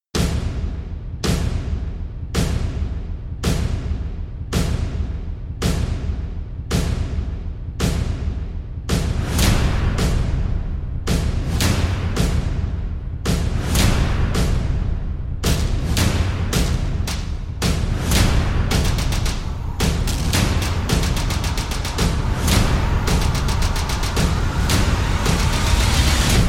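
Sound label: trailer backends percussion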